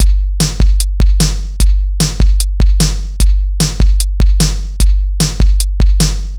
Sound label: Ditty; NBA Inside Drive 2003; Xbox